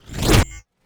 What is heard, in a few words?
wooshes transform sweetener